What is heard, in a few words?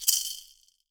SHAKE, Percussion; Miroslav Vitous - Percussion & Harp